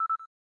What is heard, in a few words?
cam timer last